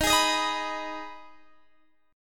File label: D sharp Suspended 4th Sharp 5th D sharp, Guitar Open D; chord shape